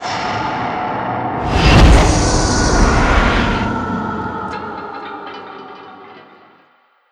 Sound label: Mission Failed; fatal frame 20th anniversary celebration soundtrack